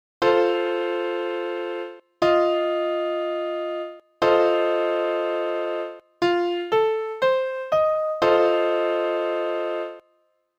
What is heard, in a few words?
Maj Min7